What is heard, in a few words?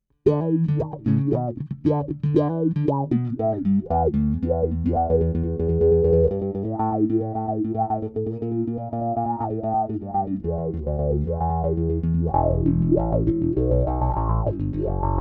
Cry Baby, effector bass